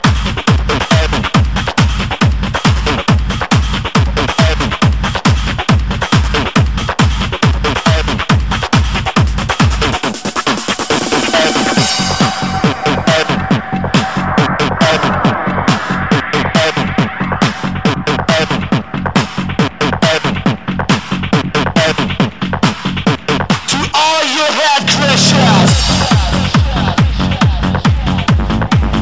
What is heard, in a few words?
Loud Bass